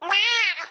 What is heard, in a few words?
doorbell cat